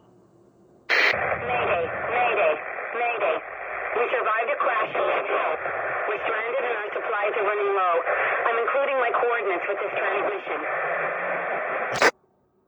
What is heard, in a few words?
Female Distress Call